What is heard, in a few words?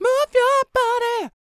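falsetto, R&B Dance; Spectrasonics Vocal Planet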